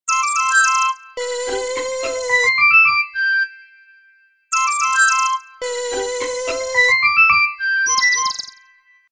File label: Birds and Bees; Sony Ericsson; Spring